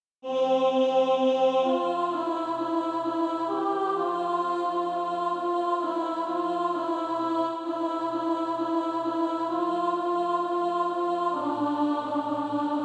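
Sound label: alto, Practise Music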